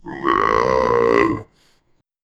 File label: long loud pig grunt; livestock